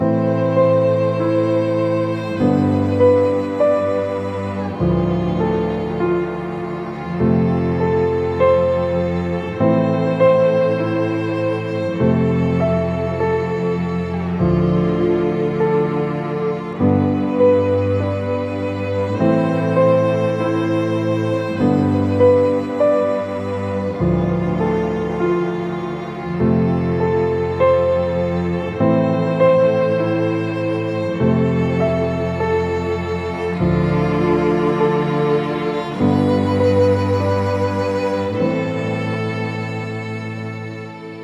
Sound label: dramatic no drum; by burak sound bank; dramatic dram vol 11